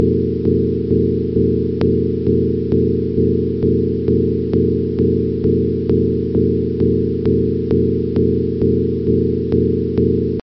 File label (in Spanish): tambor